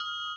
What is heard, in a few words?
filetx complete; TeamTalk V 5.5 Portable